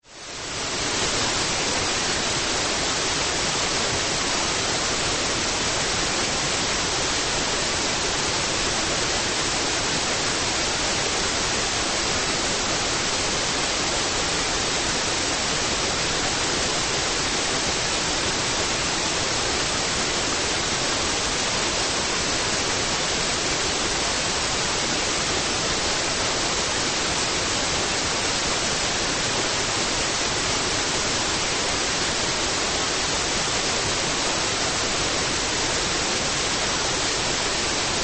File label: White Noise